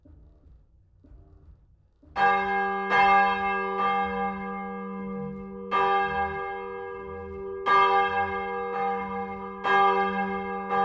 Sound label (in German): glocke gramzow